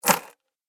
Handful Of Coins Dumped On Table; Money Sound Effects

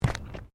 FOOTSTEPS SNEAKERS PLASTIC GROUND MAT STEP; Footstep Foley; Human

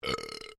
MALE BURP MEDIUM; Male burping; Human

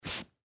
Chair Suede Fabric Cushion Wipe Off Single; Household; Offices; Blastwave

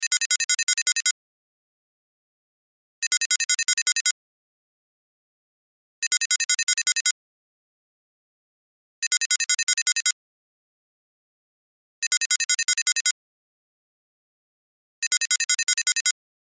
Cell Ringtone; Loop; Cell Phone; Cell Phones (Sound Effects:Electronics)

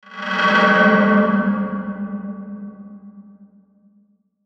Stab Dark, Stab Dark Grind Delay; Stabs

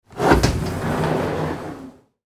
Sliding Glass Door; Blastwave

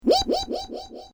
Created Creature Small Ping, Alien Sound Effects

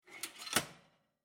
Household Toast Oven Door Close, kitchen

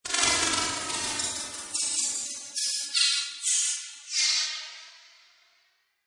Digital Hit Reverb Slow, Scifi; Scifi Production Elements, Set 1: Digital Hit Reverb; Science Fiction Sound Effects; Lynne Music

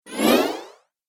Space Jump Whoosh, whoosh sound effects; sound design

